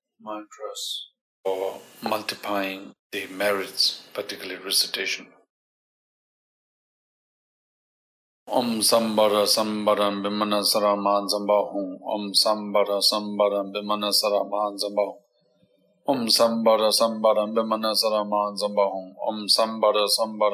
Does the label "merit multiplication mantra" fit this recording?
Yes